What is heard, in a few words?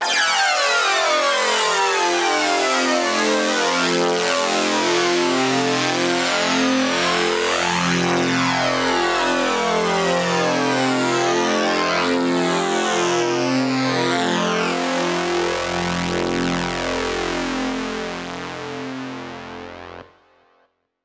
Tonal FX F